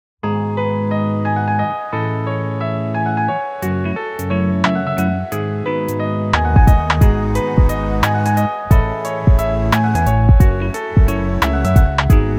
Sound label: Beat 177 Abm 5:4